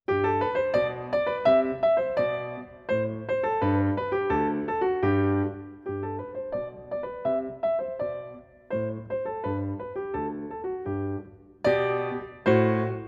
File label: Burlesque in G Major; Anonymous; Celebrate Theory recordings